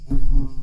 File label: Wasp